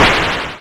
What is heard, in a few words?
wiggle; Oryx